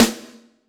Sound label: Snr, drum